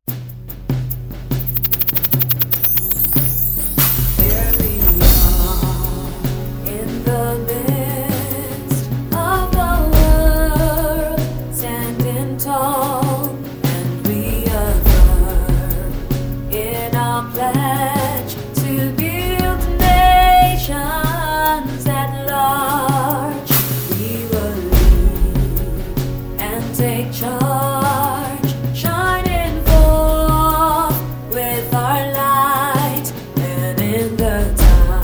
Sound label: NEW ANTHEM WITH MUSIC